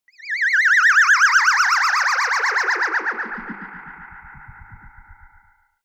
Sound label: liftoff and ufo landing